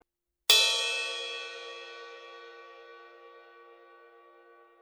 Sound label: med ride 20 bell, cymbal; sabian